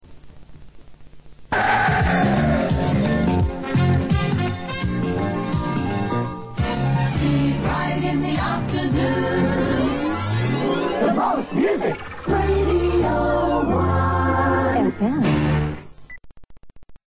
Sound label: jingle